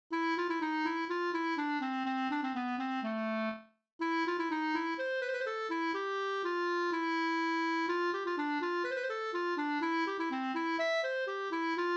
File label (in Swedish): humppa